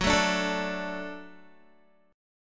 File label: Gb Minor Sharp 5th Gb Guitar; Gbm#5; strummed; 14,12,12,14,14,x